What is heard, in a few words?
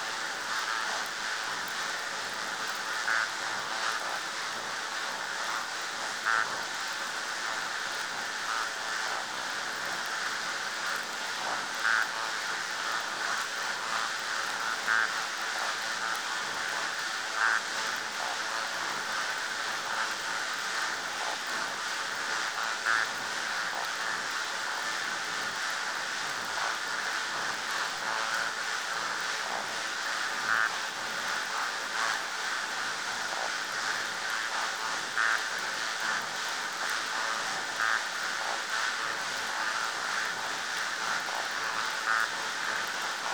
Terapon theraps